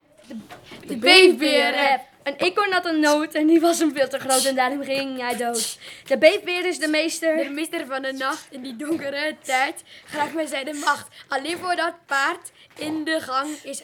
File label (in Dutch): rap